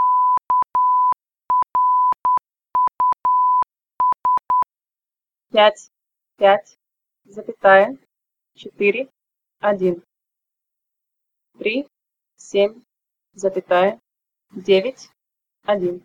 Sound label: echo